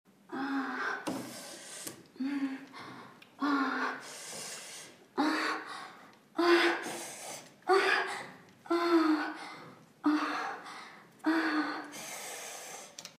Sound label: sex, moans; The Witcher Hunt